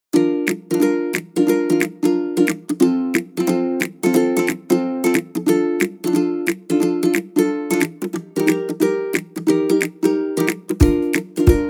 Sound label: happy playful kids music